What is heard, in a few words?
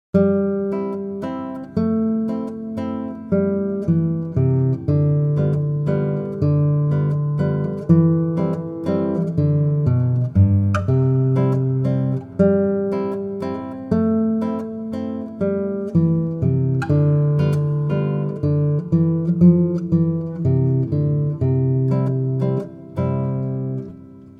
waltz, guitar